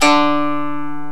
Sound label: DULCIMR KOTO; OMI - Universe of Sounds